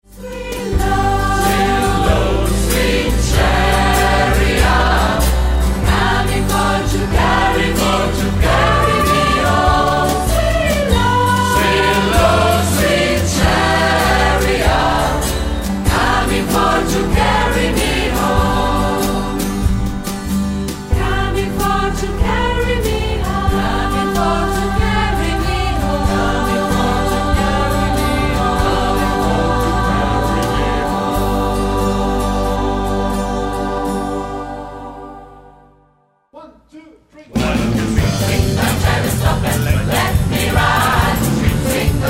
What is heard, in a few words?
swing